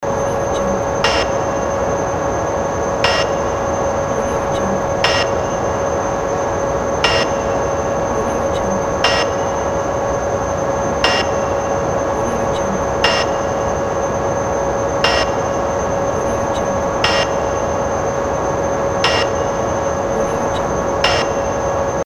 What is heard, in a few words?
space signal